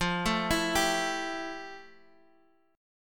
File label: F Major 9th, Guitar, Half Step Down